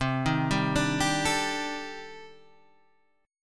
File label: C Minor 6th Add 9th C; Cm6add9 Chord {8 6 5 7 8 5} arpeggiated